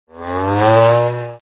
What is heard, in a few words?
cow moos